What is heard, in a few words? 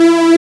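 buzz chord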